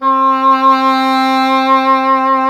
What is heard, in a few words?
E. Horn; Miroslav Vitous; Solo Instruments VOL-2; Solo lnst. II